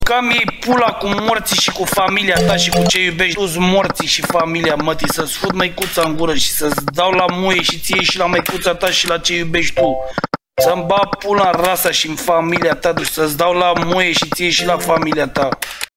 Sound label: rage